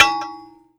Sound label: Bell Bell Dry